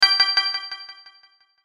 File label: Hit Bell High Short Delay; Blastwave